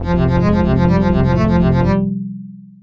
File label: String arrange Ring